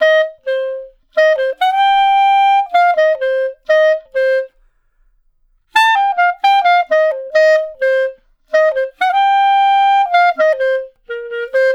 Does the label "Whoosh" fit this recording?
No